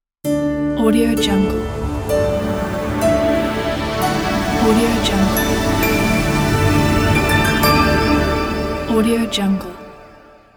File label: futuristic logo